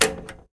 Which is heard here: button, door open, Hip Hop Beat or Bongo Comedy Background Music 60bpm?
button